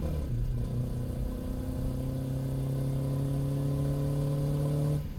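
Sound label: engine high loop; Blind Drive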